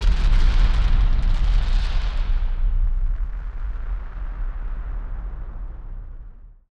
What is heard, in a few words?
Firework FX; musicradar; bomb factory